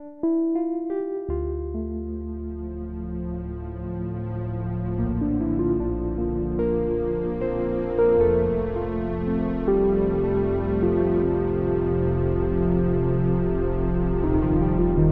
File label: Dual Rhodes Pad; Super Gemini